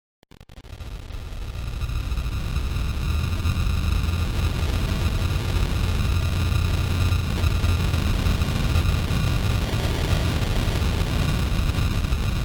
Atari Volcano Erupting

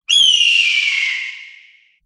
Pitched Eagle

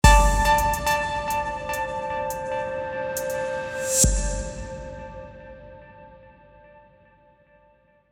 Logo Intro

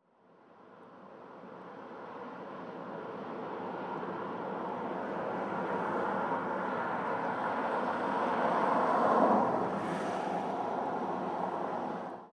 Rain car

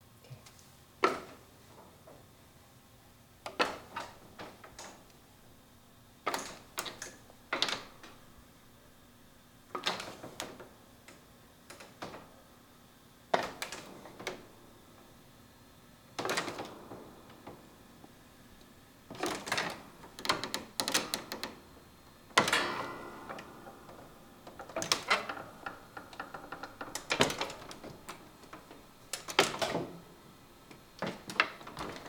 Steps On Squeeky Wooden Attic Ladder

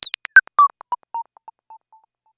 Blip

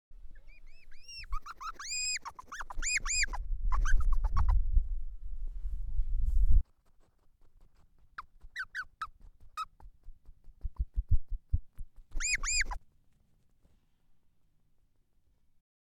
hedgehog squeling and chirping

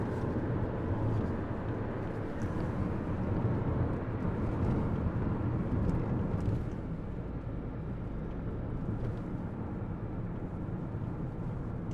Car Driving Interior

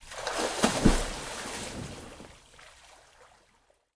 Water Splash Big Pool Jump

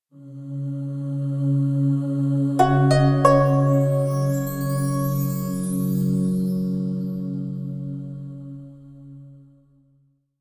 Asian Logo

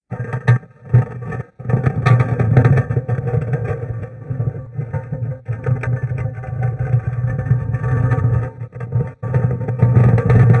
Dragging Sword; Remix Of Smidoid Randomscrape

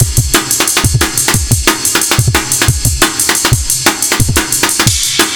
Pseudo amen Break

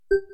Sfx Menu Select

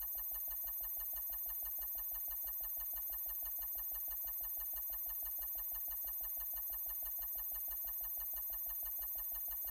Movie Caption Long

